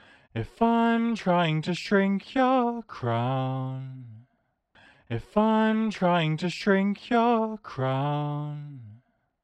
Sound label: warble shifted